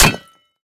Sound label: sword hit; smite; olympus